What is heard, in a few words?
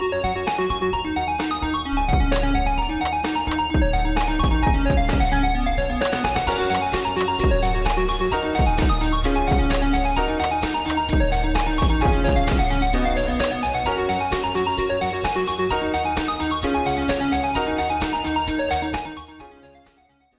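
guitar ringtone; nokia gitaer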